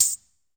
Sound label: Tambourine, Percussion